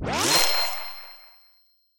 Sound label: Special & Powerup; Items & Collectables; ArmyRaid